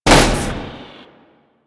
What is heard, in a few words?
Electronics Misc Destroy; Warframe; Ambience; Nineteen Ninety Nine